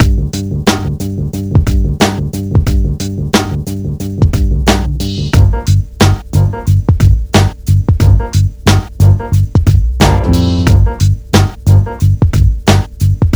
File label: beat test